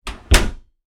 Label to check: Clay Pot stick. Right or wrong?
Wrong